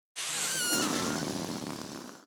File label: fungus balloon death